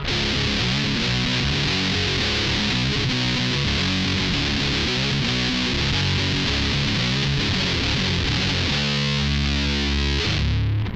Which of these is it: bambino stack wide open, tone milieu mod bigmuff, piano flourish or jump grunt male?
tone milieu mod bigmuff